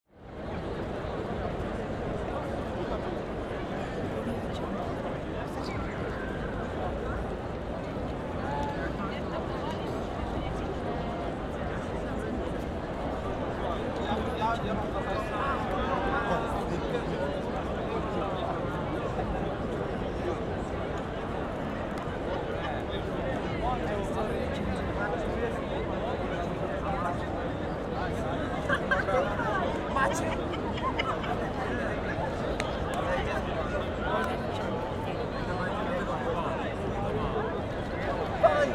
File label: krakow main square market at night; urban sounds